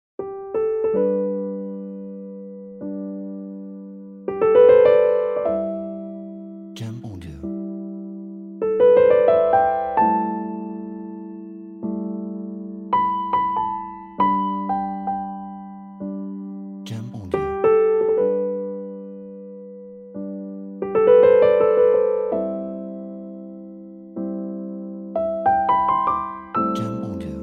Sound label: Piano Background